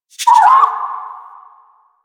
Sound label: Rabbit Ambient Call; Duviri; Animals